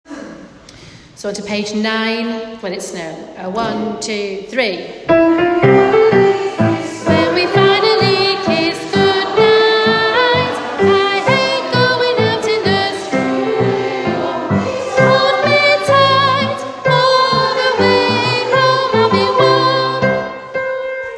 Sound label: bass